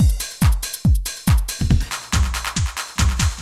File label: techno 140